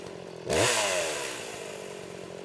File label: chainsaw wield